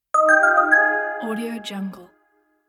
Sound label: interface message notification